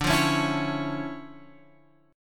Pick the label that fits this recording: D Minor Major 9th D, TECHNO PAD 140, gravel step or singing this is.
D Minor Major 9th D